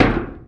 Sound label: IMPACT Metal Soft Plate; mono; IMPACTS; Universal Sound FX